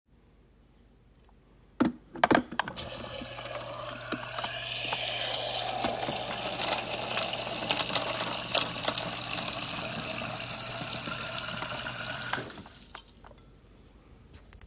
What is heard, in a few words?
Water dispenser